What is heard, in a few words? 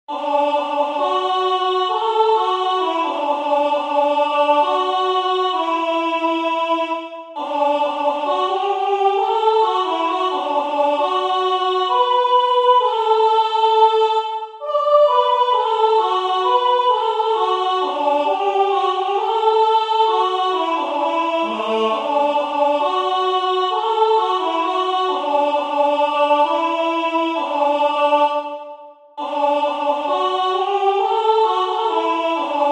Vocal Solo